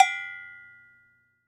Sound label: Chacha Bell, World Percussion; Cymatics; PHARAOH Beta Pack; Pharaoh Premium Drum Samples (BETA)